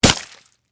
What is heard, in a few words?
Impact Flesh; Horror, Impact, Flesh; Blood and Guts